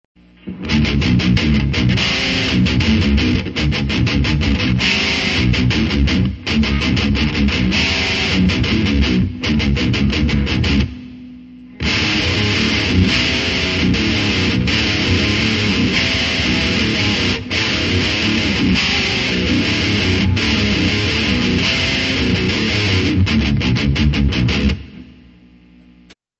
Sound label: Titan Thick Chunk